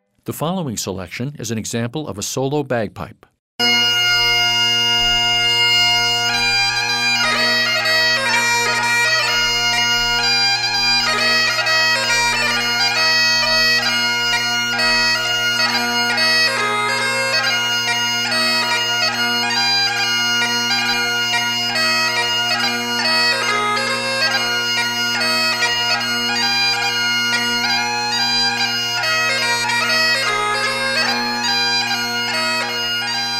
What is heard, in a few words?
Solo Bagpipes